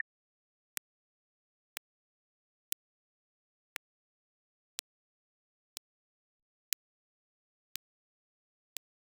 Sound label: soft ui button tap gentle